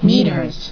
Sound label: meters